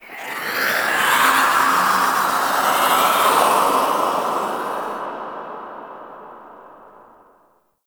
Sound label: Golden Maw Ambient Whispers; Warframe; The War Within